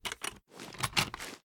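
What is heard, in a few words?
mg3 taking the mag; tacz; daffas arsenal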